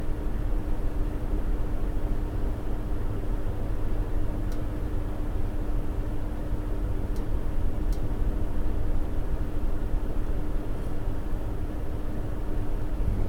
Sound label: ceiling fan